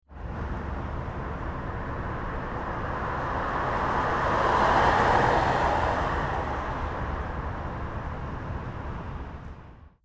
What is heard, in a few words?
City traffic